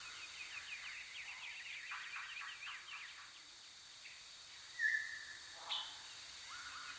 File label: Bird Insecte Loop